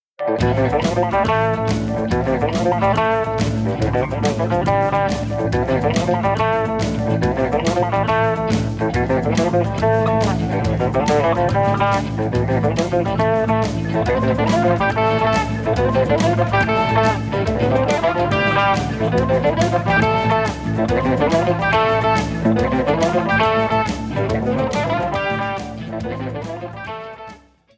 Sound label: polish jig